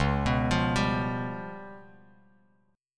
Db 7th Flat 5th, Bass Standard; Db7b5 arpeggiated; JGuitar

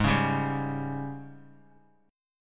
G sharp Major 7th Suspended 4th G sharp; G#M7sus4 strummed; JGuitar

